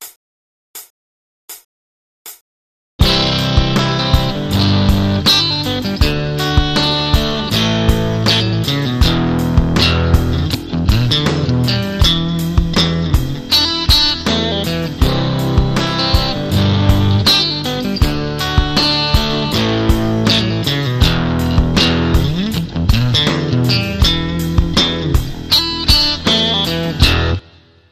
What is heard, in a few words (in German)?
rhythmus